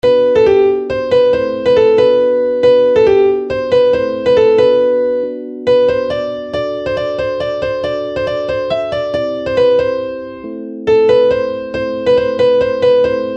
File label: keyboard